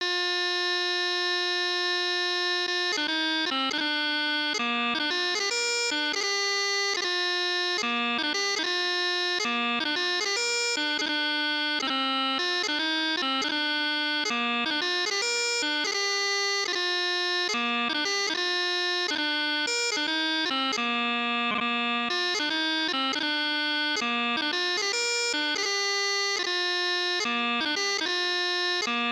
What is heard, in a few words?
Chanter; Meall Gorm